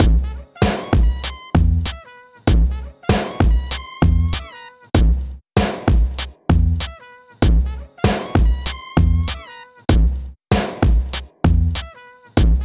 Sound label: Hip Hop